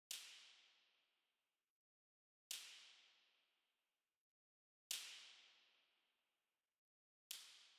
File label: Perc Layer